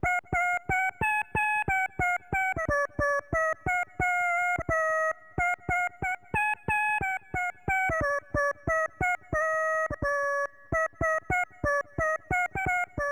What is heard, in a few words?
pian sing; NiGHTS Into Dreams... (PS2) (gamerip) (2008)